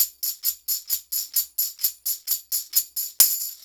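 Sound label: TAMB, tambourine; Drumdrops In Dub VOL-1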